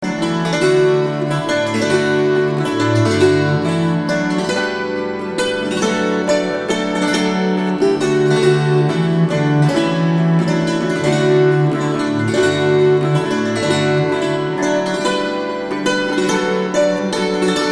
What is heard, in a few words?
Dulcimer Waltz